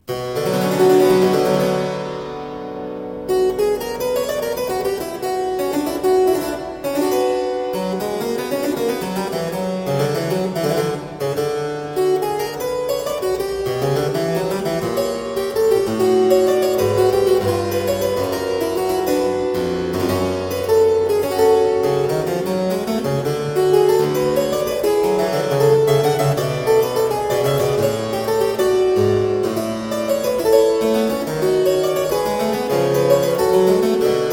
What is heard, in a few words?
Suite in C Minor - Prelude, spoken, Keyboard Music; William Croft